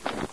snow, footsteps; Source Engine; cstrike

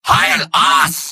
medic battlecry; mvm

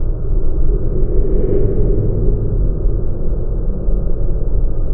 ambience base, ambient atmosphere; video games; source engine; portal2

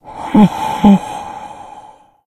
death, infected male; npc; Left 4 Dead 2; Source Engine

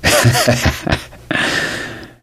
laughter, gambler, survivor voice

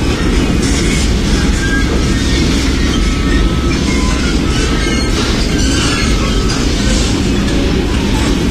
test chamber travel; Source Engine; Portal 2

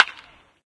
baseball default sound, windows 9x; sound schemes

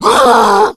hulk pain fire; Left 4 Dead; Source Engine; tank

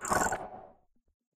editor cube collapse; Source Engine; Portal 2

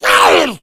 hunter shred, attack voice; source engine; left 4 dead 2

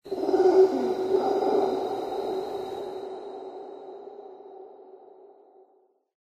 animal call yeti, lair; Source Engine; tf

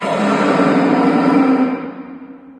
inside battle antlion, prison; ambient; source engine; hl2; video games

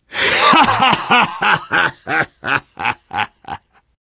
laughter, biker; voice

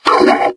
knockout, metropolice; Source Engine; video game; npc

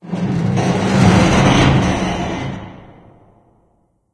metal randoms, ambience; source engine; portal2

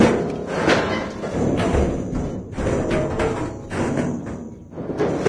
gravity gun sheet metal loop; video games; source engine; episodic

